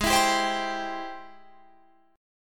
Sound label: Ab Augmented Major 7th Ab Guitar Drop D